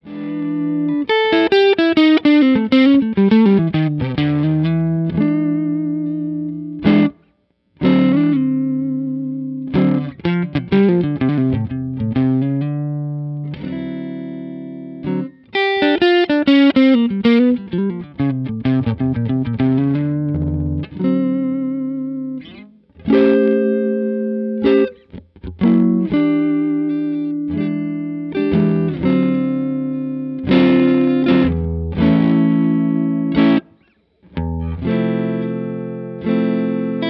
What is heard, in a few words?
JTM Input Lo Strat Neck; Input 2